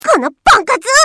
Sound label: grenade; csgo